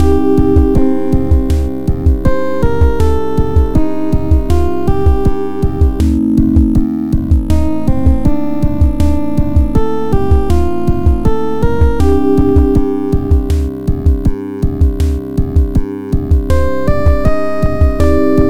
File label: lounging